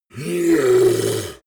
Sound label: yeti growl angry